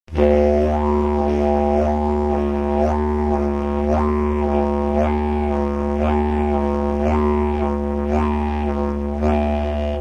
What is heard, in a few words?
fast chk mod, didgeridoo; Now let's try speeding up this modulation like we did before with the volume.; Timeless Productions; didgeridoo tutorial